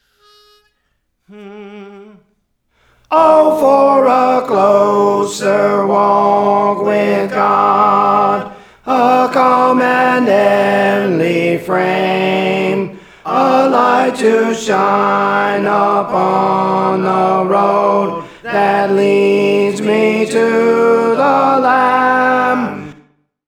Tenor; Praise For The Lord Training; O for a Closer Walk with God

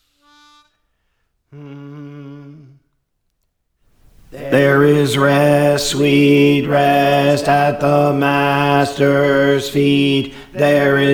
Bass; There Is Rest Sweet Rest; Praise For The Lord Training

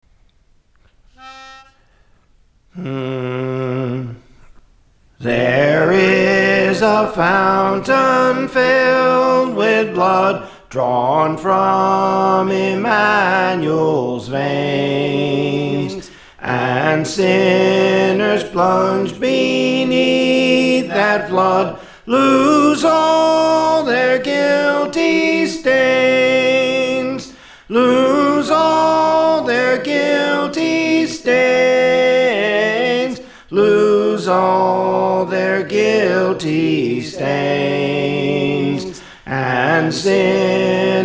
Soprano; There Is a Fountain; Praise For The Lord Training

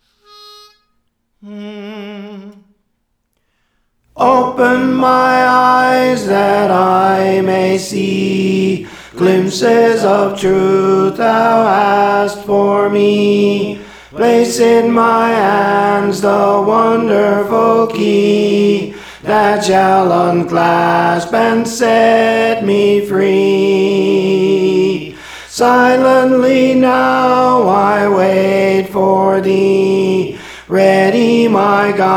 Tenor; Open My Eyes That I May See; Praise For The Lord Training

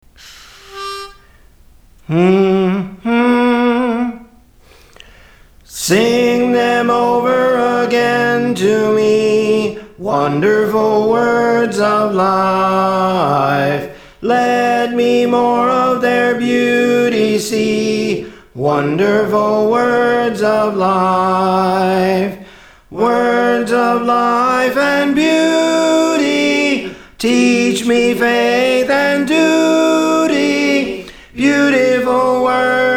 Soprano; Praise For The Lord Training

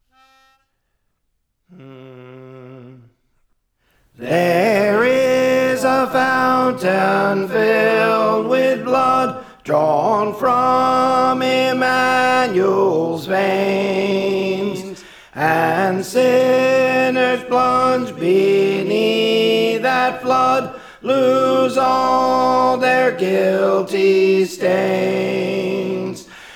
Tenor; There Is a Fountain; Praise For The Lord Training

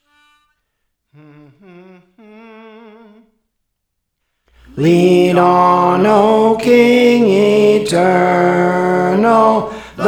Tenor; Praise For The Lord Training; Lead On O King Eternal

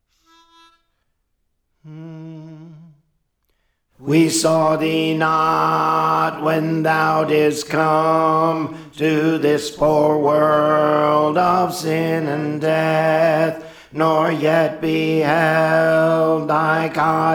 Alto; Praise For The Lord Training

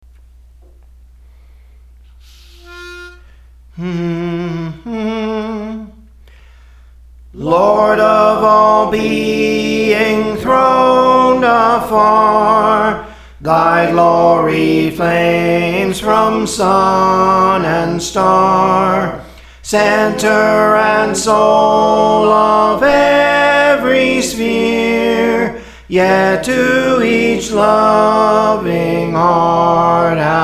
Soprano, Songs; Praise For The Lord Training; Lord of All Being Throned Afar

